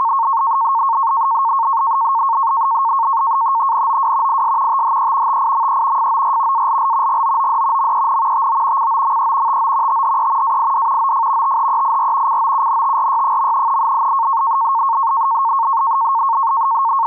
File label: navtex example